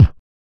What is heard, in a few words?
Basedrum